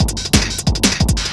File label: Speed loop, Drums Beats